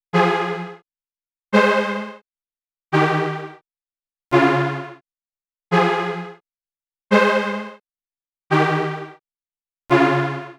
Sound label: Chords Fmin